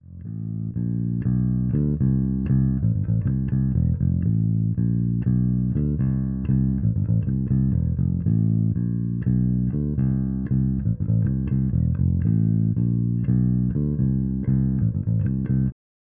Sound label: Mini Mark B; Zoom B2 Four